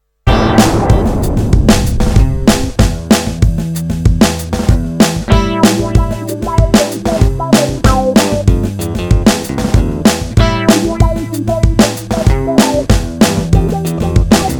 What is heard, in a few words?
funky